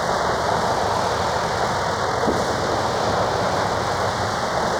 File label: Cracklature, sparse soundscape